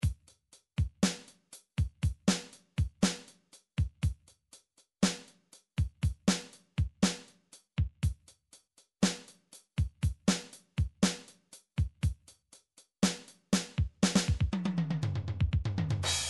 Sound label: midi drum patterns drums halftime